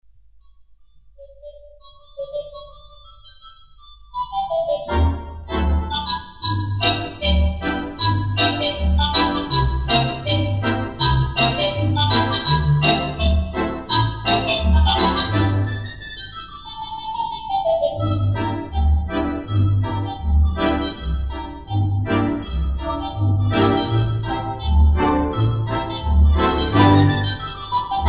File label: Jazz Pizzicato, theatre organ; Tooting